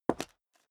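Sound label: step high heels concrete grit single left, footsteps; schritte; menschen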